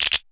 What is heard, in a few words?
PERC COMBI; Crypton - DrumBase5000